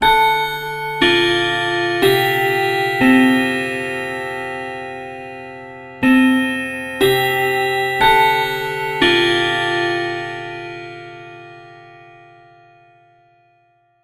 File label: school bell